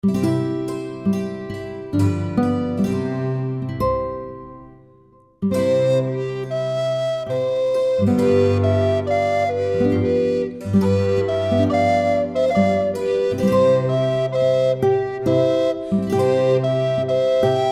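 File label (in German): Jodler, Flötentöne; 29 - Jodler 2; Jede Menge Floetentoene 1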